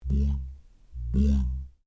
Created Creature Large Vocalise, Alien Sound Effects; Created creatures, vocalisations and pretend speech